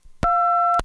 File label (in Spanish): espera la llamada